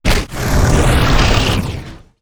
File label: wooshes transform sweetener